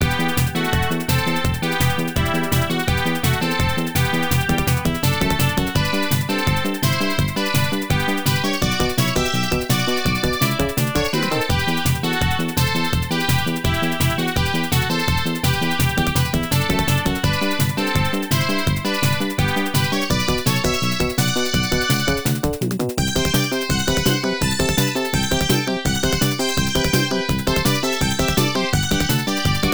Stage BGM; Slash Fighter; Stage 4 BGM

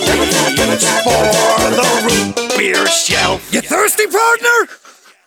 Sting; Verrückt; Double Tap Root Beer Perk-a-Cola

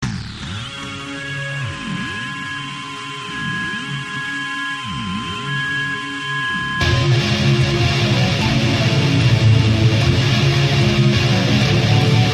Loop Bossanova; Tales from the Borderlands

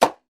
Bee Toggle Sound; Jolly Battle

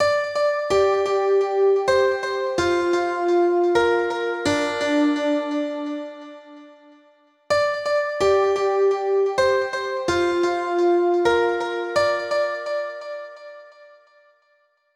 Melody; super crush k.o.

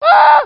Voices Victims - SCREAM

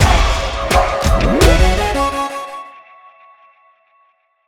sting learnsomething short; OK K.O. Lets Play Heroes

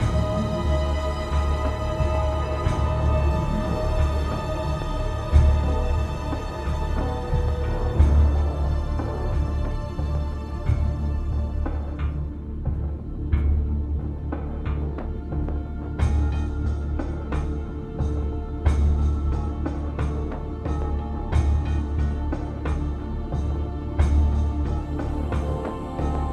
Approaching Target; assassins creed ii

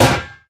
Metal Heavy Damaged

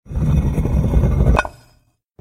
Stone Move; Secrets of Magic: The Book of Spells

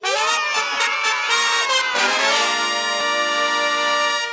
Boss Defeat

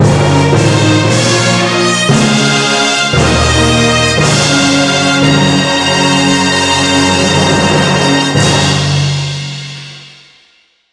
Jingle; Future Card Buddyfight; Appears Our Strongest Buddy; 3DS

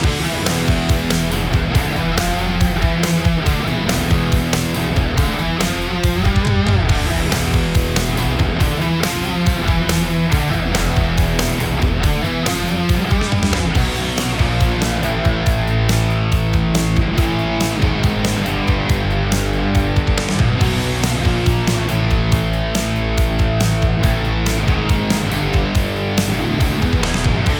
Boss Loop; Okunoka

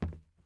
plastic step

